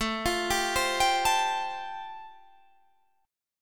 A-Minor 7th Sharp 5th, Guitar Drop D, chord

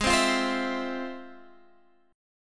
F Minor Major 7th, chord

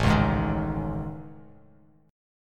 A Major Sharp 11th, Bass Standard; AM#11; strummed; JGuitar

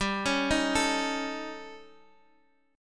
D sharp 7th Flat 5th, G

